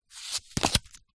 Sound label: leisure playing card turn over on top of deck